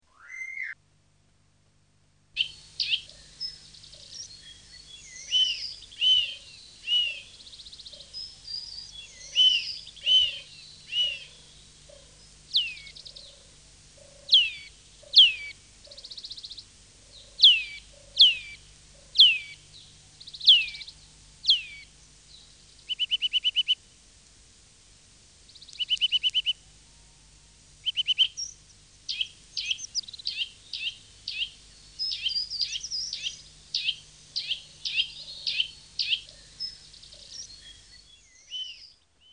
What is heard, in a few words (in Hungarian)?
Csuszka; Madarak